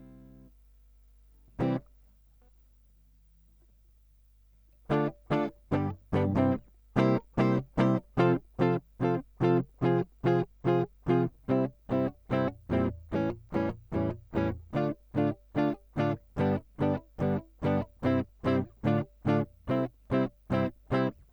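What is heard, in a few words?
Guitar Line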